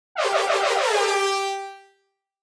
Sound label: Reggae air horn G3 multiple blasts